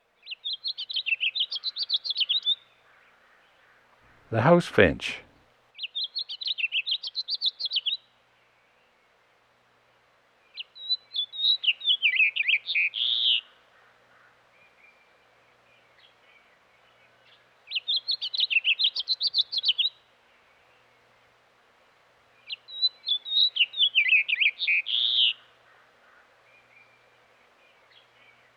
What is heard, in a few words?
House Finch; Western North America; Dan Gibson; Wildlife Identification By Sound